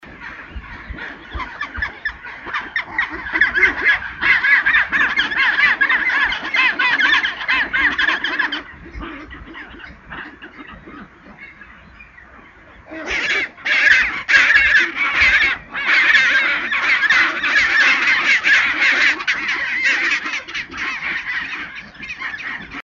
pygoscelis antarctica, baily's head, isla decepcion, antartida